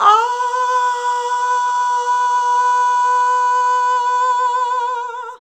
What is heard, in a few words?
VOX, Singin; Sound & Vision; Gigapack II CD 2 (Roland)